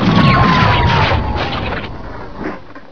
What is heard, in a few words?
turret die; source engine; hl1; video games